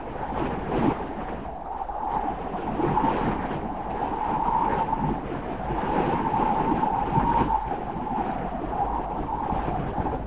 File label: loop wind